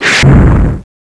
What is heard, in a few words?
Fire Torpedo; Covert Action; Strategy